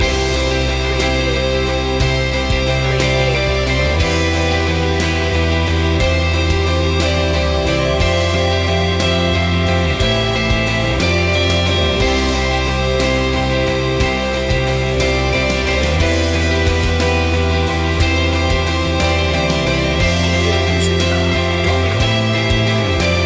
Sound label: over and over loop